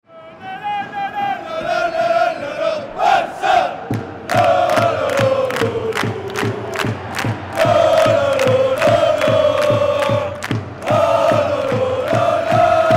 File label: lalalalala